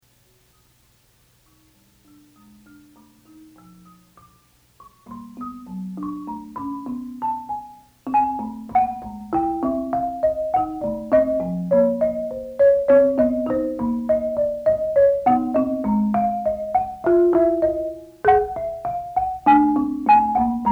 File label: Marimba interlude